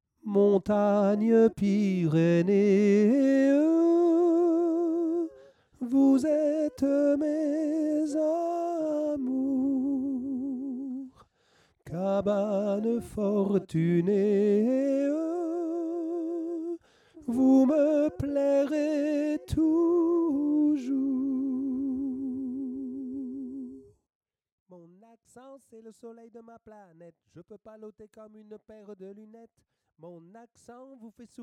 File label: tenor; Ténors